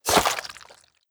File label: flesh; Rust